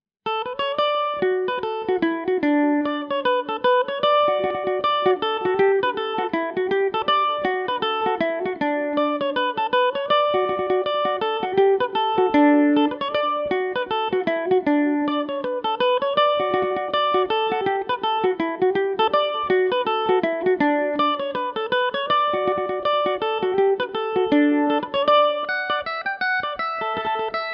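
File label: blackthorn D major revelation revisited, mandolin; The Blackthorn; Revelation Jaguar electric mandolin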